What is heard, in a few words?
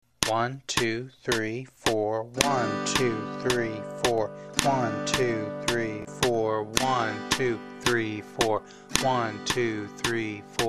rhythm 110, guitar; Here it is at 110BMP